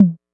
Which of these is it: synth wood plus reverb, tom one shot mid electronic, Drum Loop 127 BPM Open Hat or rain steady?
tom one shot mid electronic